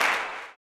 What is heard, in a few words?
CLAP, blues & soul; ILIO; Vocal Planet